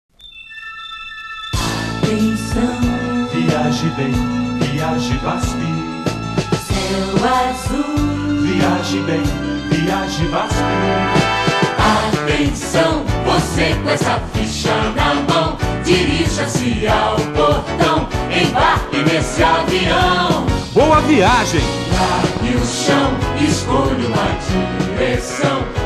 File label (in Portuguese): jingle; vasp